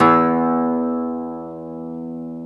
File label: GTR Nylon, Roland